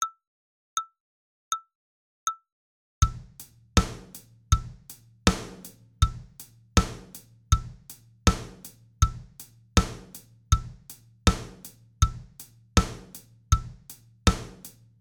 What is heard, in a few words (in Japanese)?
裏打ち